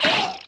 wriggler death; enemies; Factorio

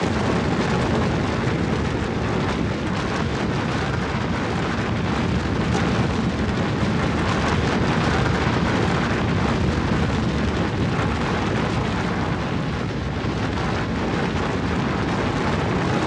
demolisher moves, enemies; Factorio

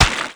mining; cuttlepop; Factorio

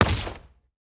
fly impact; enemies; Factorio; strafer

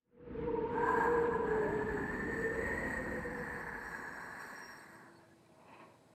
cold wind gust; factorio

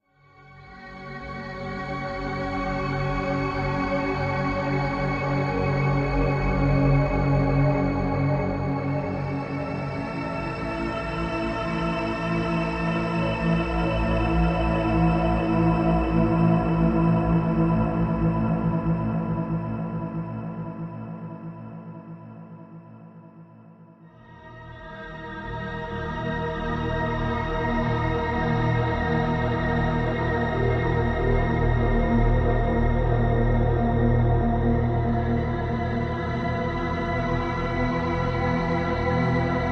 interlude, ambient; Factorio - Space Age

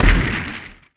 asteroid collision interstellar small; Factorio

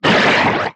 strafer warcry big, enemies; Factorio

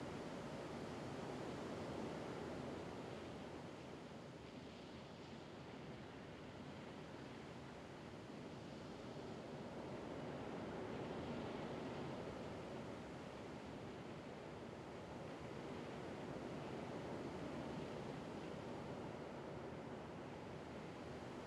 wind vulcanus, factorio sound wind

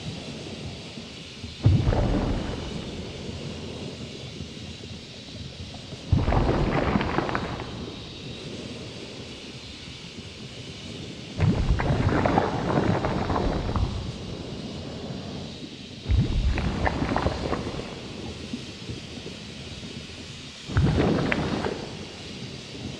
chimney vent, factorio sound world decoratives

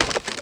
axe mining; funneltrunk; Factorio

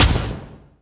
asteroid damage carbonic medium; Factorio

